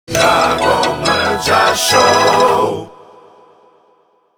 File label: jingle